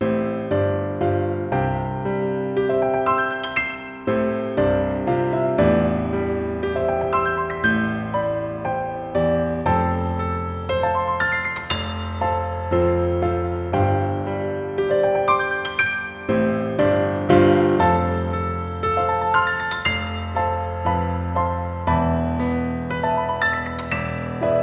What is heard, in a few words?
Arpeggio Exercise